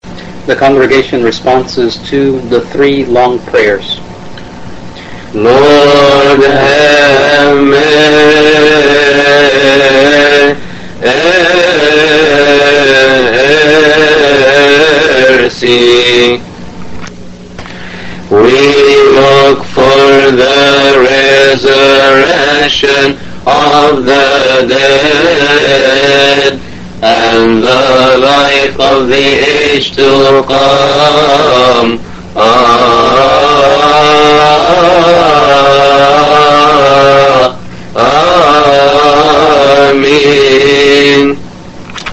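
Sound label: English liturgical hymns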